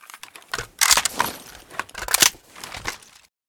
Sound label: wa2000 reload